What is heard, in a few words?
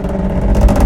metal solid strain; Source Engine; video game; physics